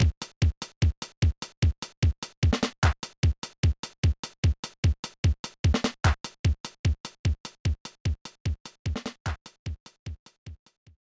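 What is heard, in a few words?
background music; No No No Puzzle Chailien; GBA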